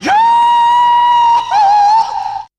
yah hoo

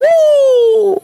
"woo"

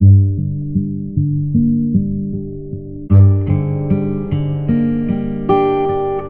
guitar, Jazzmatazz Loops

